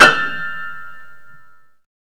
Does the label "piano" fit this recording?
Yes